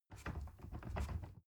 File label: ladder wood rattling